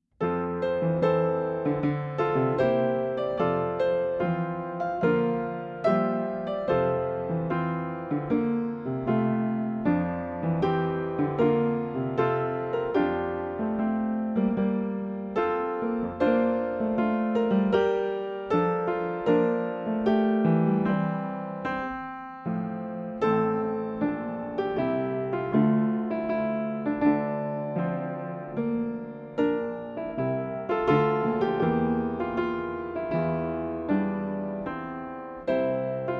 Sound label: Section B Accompaniment